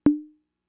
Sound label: Conga, Fricke MFB-522